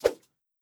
Swoosh Swipe Smooth

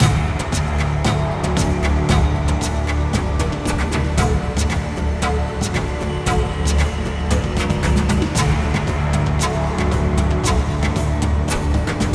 Land Combat Jungle Day

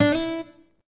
guitar

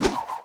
spinner Jump; unsighted

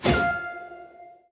Quest start; Folk Hero

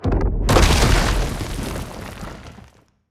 Ship Crash Into Rocks; battle suit aces

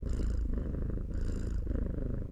Purr; Cait

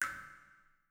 Cave Drip; Audiosurf 2

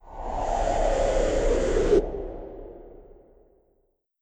Preparation phase; Preparationphaseb

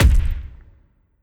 Skill hit; Moine

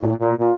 Trombone; Special-Photo Impossible-Triangle

